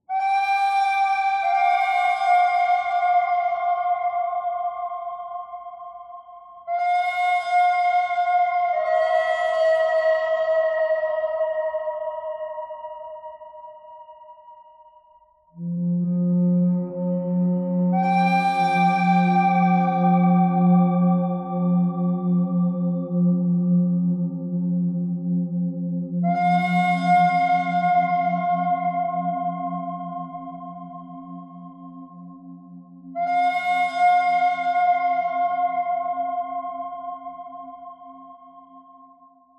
bird thread buzz; birds

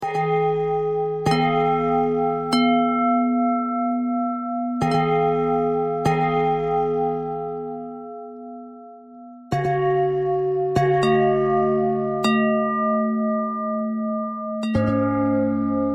bell thread pentatonic

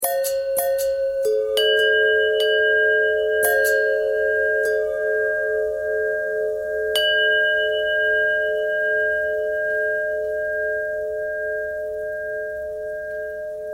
bell long bell thread noise, bells

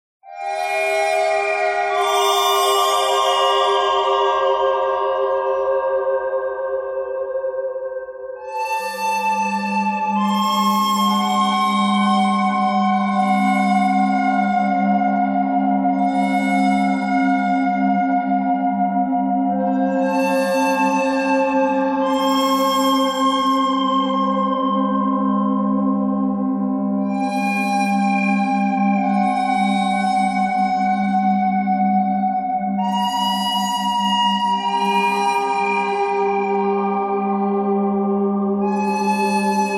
bird thread buzz all; birds

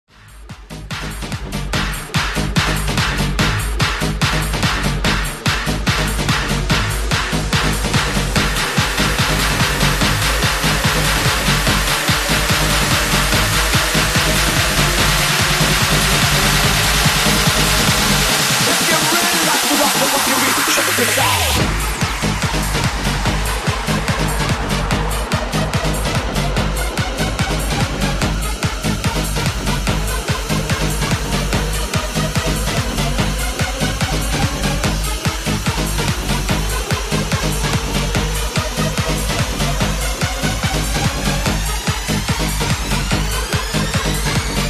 Funky Beat

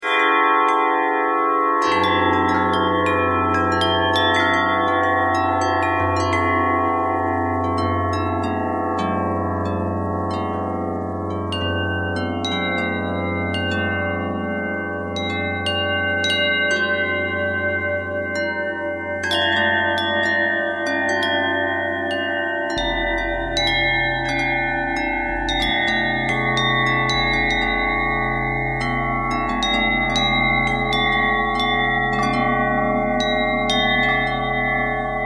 bell twist

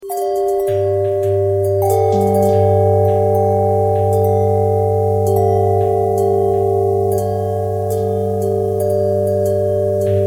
bell twist

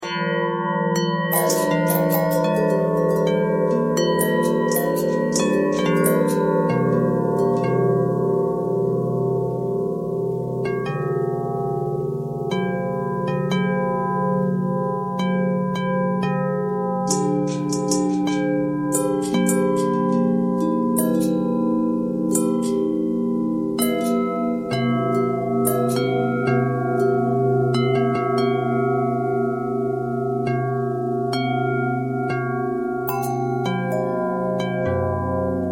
long bell bell thread noise, bells

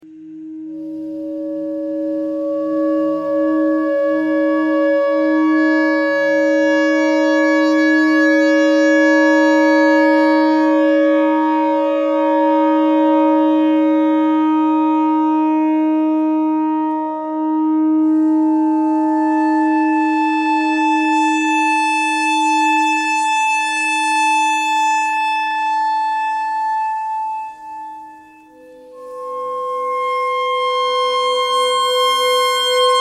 clarinet notes e clarinet notes e thread noise

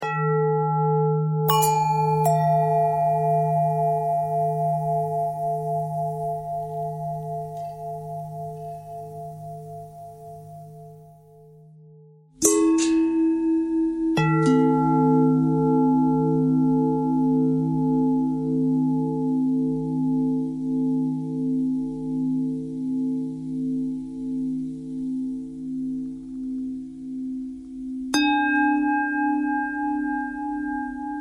long bell bell bend threads

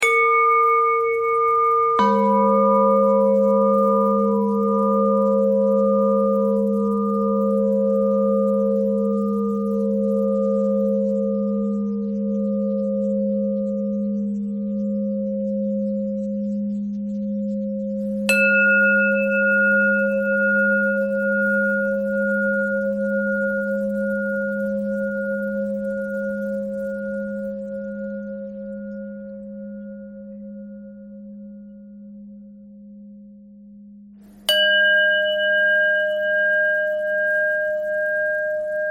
bell thread pentatonic

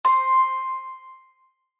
Correct

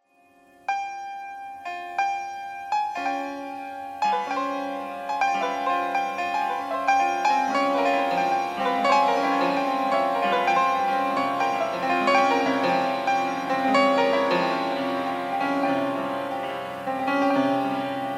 piano thread noise

